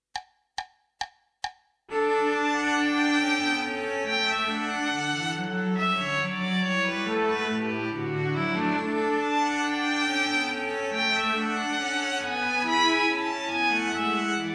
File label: gavotte; minus Violin 2